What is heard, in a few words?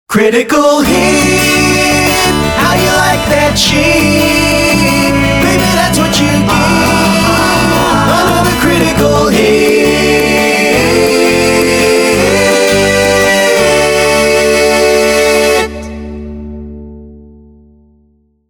Critical Hit